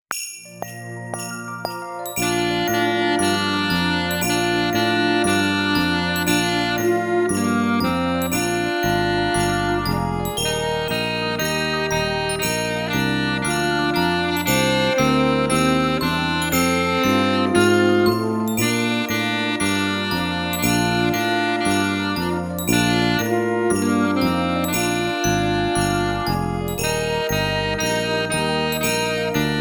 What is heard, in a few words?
Jingle Bells